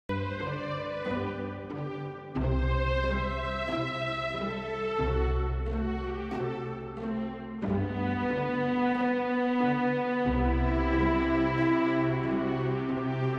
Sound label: allegretto excerpt